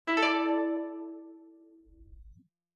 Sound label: retry